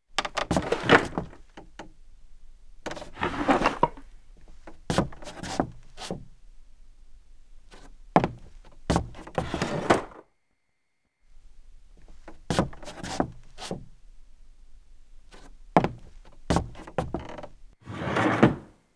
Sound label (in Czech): krabice krok; Mafie 1